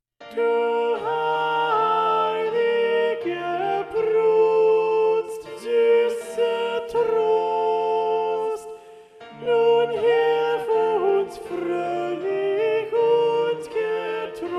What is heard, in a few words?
motet alto